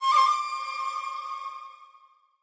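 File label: Skill background music; Naruto Ultimate Storm; android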